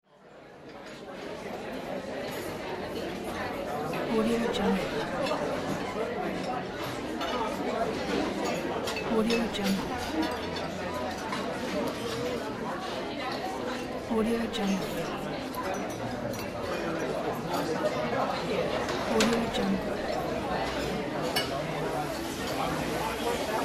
restaurant

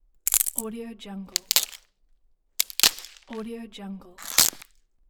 branch break

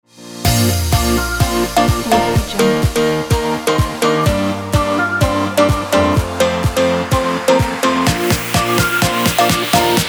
fun pop upbeat summer

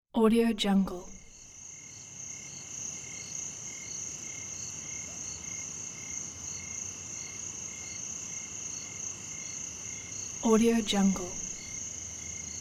calm night ambience

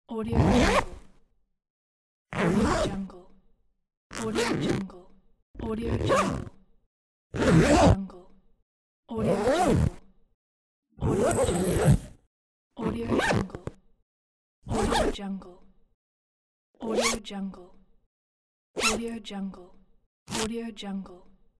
zipper

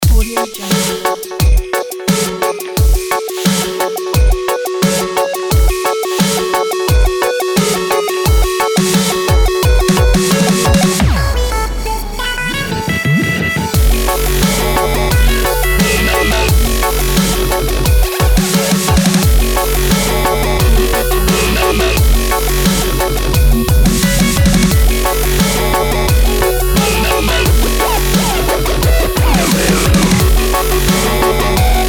hard drums step

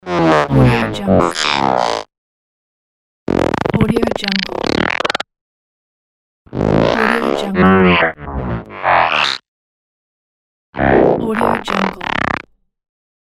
droids robots

